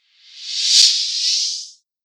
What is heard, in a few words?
Thin Reverse Whoosh; Whoosh Sound Effects